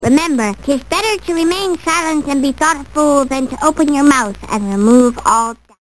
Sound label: open mouth